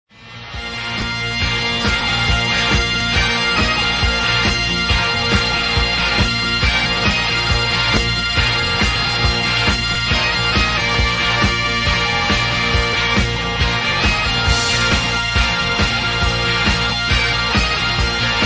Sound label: bagpipes ringtone